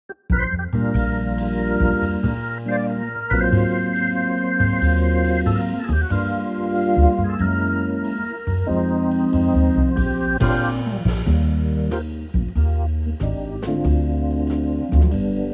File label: Eb Organ Trio, male